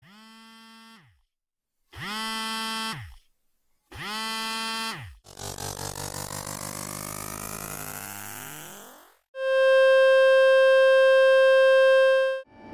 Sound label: Water coming out of the phone